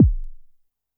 korg trident

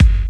KIK

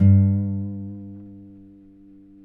GUITARS TAKA FINGER; Spectrasonics; Hans Zimmer Guitars VOL-1

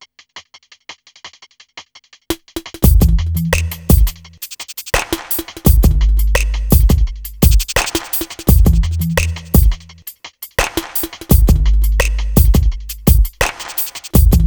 MIX, 85, Hip Hop R n B; USB Soundscan vol.19; AKAI; TIMBA

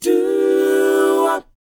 DOWOP A; ILIO; Vocal Planet

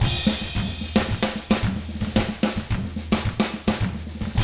110 SMBA samba; ILIO; Double Platinum Drums 1

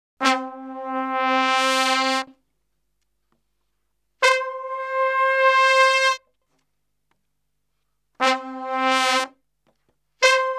Salsa Picante; Masterbits; World Zone VOL-3

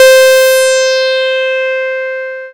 Dance Floor Bass, Multisample Basses

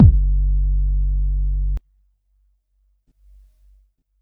Quasimidi 309, Drums

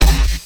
Stab G

